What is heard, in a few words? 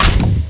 NAV OFF; mtm2